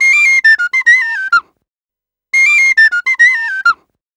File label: Penny 103bpm F, Penny Whistle; African; Quazibeat; African Secret